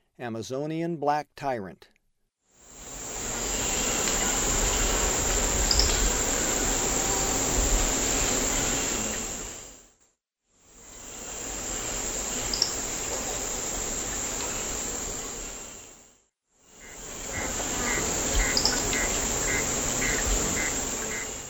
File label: Amazonian Black-Tyrant; S. America; Eastern Lowlands